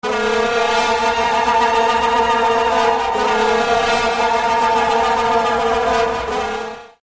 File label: Vuvuzela Atmosphere